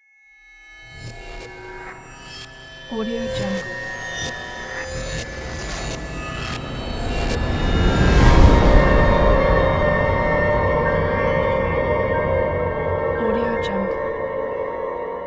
hybrid horror logo

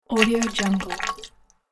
water fast little bubbles

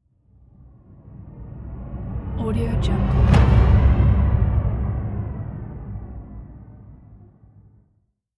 impact explosion

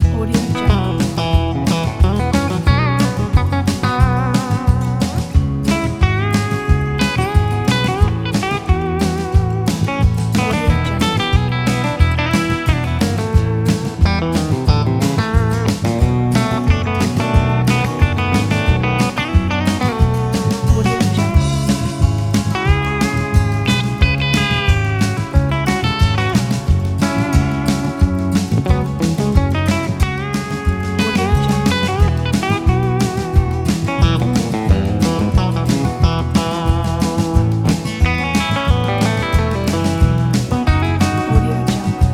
outlaw country train station

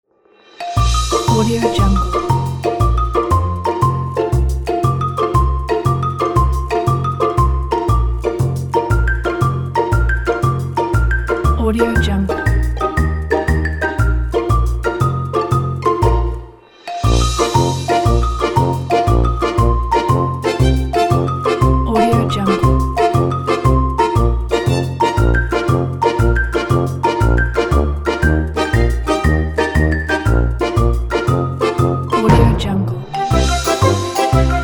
cartoon music; go2music

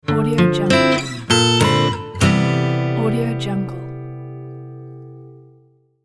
gentle acoustic logo; monochromeeffect

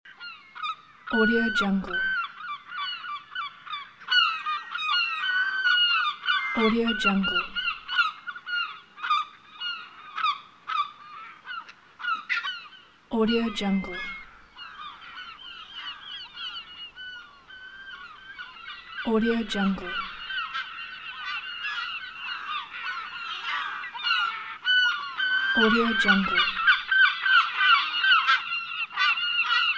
seagulls and crows; audio-tree

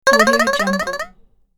boingy cartoon alien effect

